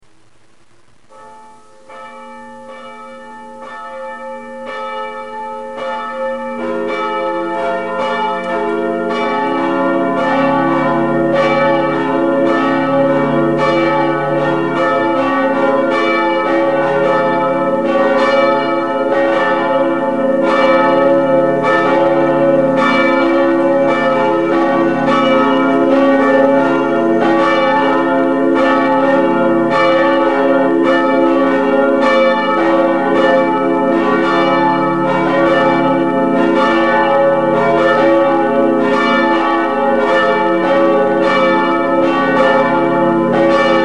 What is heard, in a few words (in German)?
Glocken alte